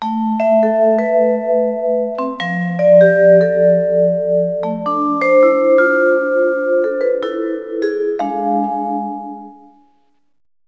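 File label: vibraphone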